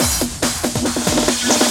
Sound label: Fills, Loops 140 BPM